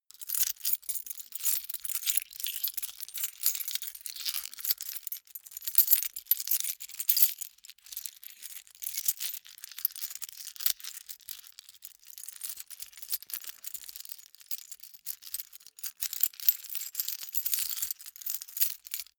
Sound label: key ring large rattle sequence; objekte; schluessel